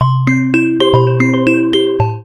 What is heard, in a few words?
xiaomi redmi poco x4 pro 5g sms ringtone